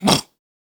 snoring human; soundscrate

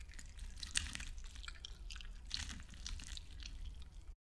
stylized squish; soundscrate

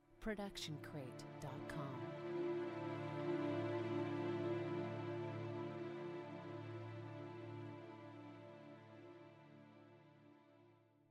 somber strings cresc decresc; soundscrate

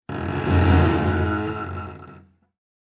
Cello Scratchy Noises; SoundsCrate

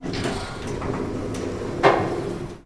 elevator door open; soundscrate

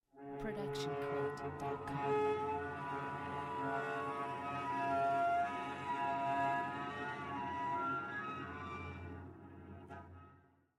Cello Scary Movie; SoundsCrate

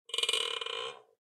Chair Squeak

